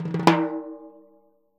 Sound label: TIMBHI S.1 L, Synclavier Percussion; ILIO; Synclavier Percussion 2; World & Orchestral